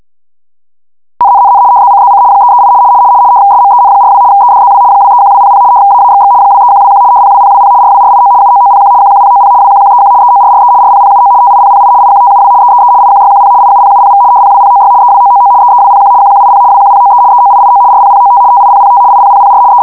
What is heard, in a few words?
mode synop, Radio